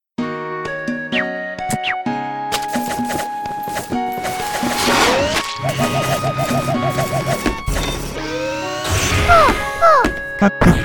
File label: success; mojib ribbon